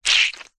horror gore fresh fleshy guts bloody squeeze squelch